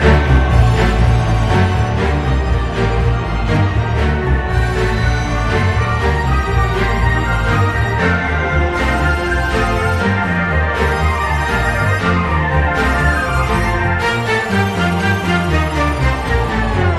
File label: instrum